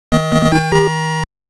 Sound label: Victory, NES; Loopz